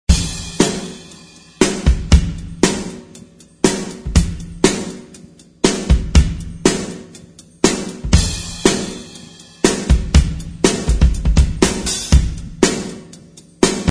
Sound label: drums